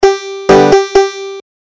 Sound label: pennsylvania hornpipe, banjo